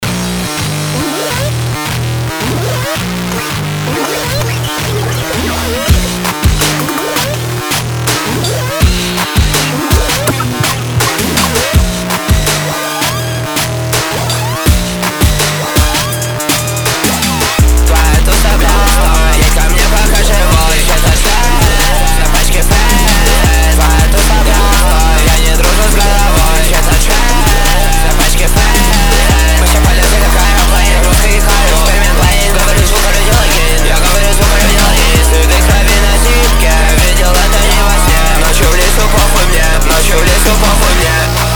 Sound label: trap